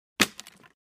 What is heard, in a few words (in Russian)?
lomanie vetki